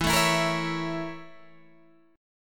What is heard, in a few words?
Bb Minor Major 7th Flat 5th